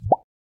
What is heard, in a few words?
Email Sent